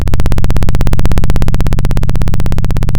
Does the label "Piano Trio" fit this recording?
No